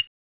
RSS PERC

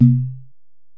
Ac Perc, Drum Machines Alesis DM-PRO

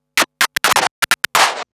Scratch Fills 140BPM

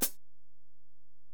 HiHat, Alesis DM-PRO

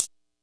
kit, Yamaha Motif-ES8

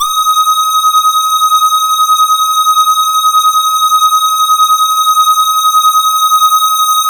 LUCKY SWP, SYNTH; Keith Emerson Signature Series

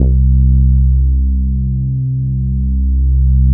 SB HORN; Wizoo - Powered Nord; STEREO LAYER

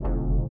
LIQUITAR; Spectrasonics; Metamorphosis

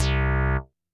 synth note, Fmin; Big Fish Audio; Hardcore Breakbeat Action; Takin It Deeper

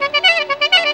CLARI GROOVE; Best Service; World Colours

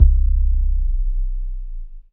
DM BAS, Dance Mega Synth; Best Service

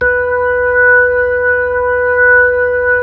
B-3 Club Xfade, Keyboards of The 60's and 70's

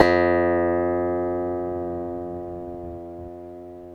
ASIA KOTO F1; Yamaha VP-1; Music Store; 90's Sample CDs

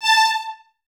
Violins Detache A 5; Miroslav Vitous; String Ensembles

